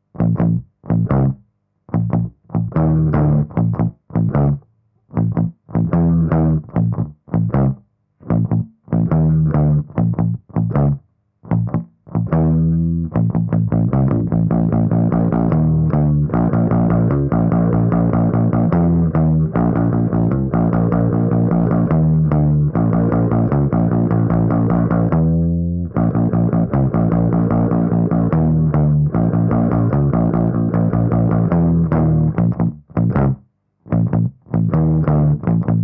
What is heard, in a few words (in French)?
basse